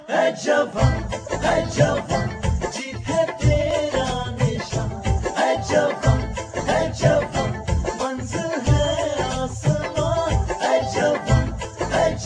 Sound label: Independence Day Ringtone